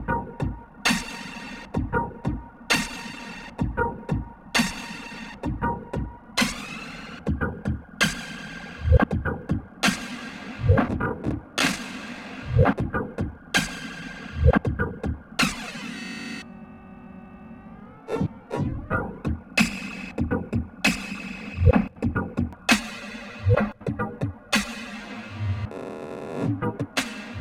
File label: 1010music BitBox Micro Modulation Grain Speed Parameter on loop